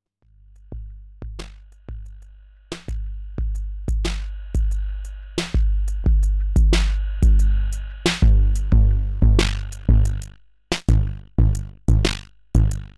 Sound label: HI GAIN 808 Beat; Snazzy FX